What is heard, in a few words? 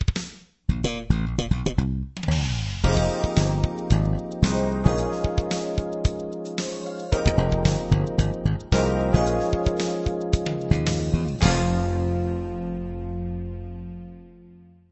Bass; Soft